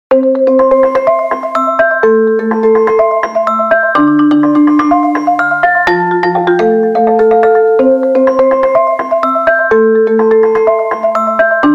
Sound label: Marimba, ringtone